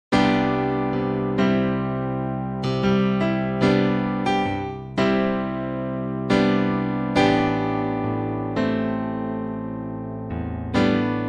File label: Ballad